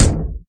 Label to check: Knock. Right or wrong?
Right